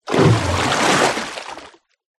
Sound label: nature water deep movement surface